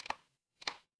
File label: ui unlock